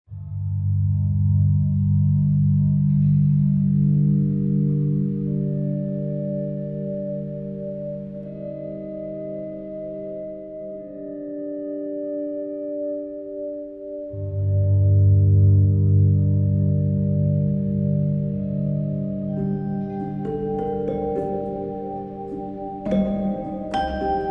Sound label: Dulcitone; launch trailer track